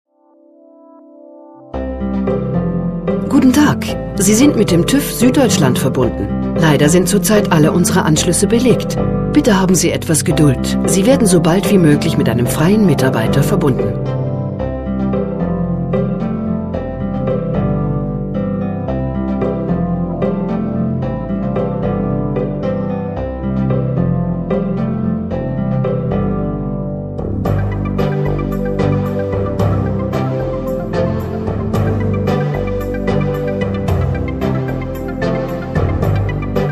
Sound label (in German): warteschleife